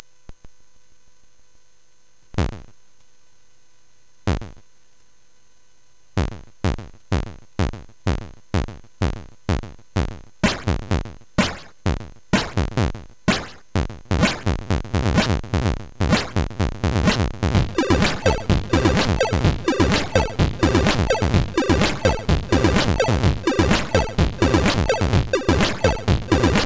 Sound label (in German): Kirchenlied, sid; CSG8580R5; hvsc